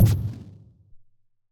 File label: Projectile Hit